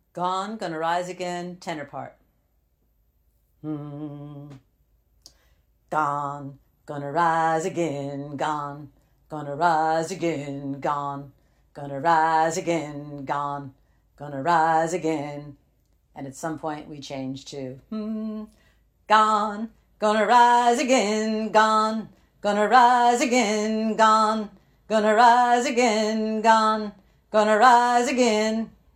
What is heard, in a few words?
Gone gonna rise again tenor, chorus